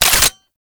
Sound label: grenade launcher reload; Weapons; Scaldra